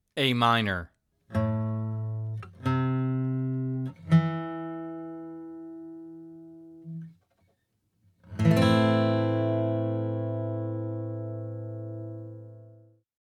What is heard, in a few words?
Minor Chords